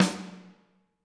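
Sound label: snare drum, Drums; Bob Clearmountain Drums I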